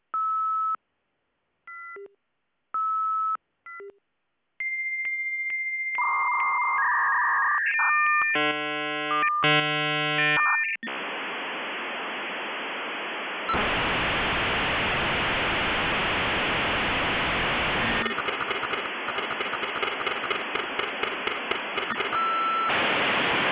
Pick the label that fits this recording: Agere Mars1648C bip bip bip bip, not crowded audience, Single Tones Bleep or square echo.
Agere Mars1648C bip bip bip bip